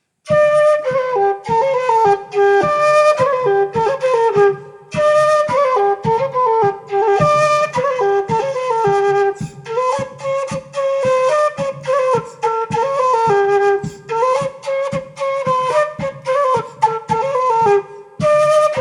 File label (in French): Air limousin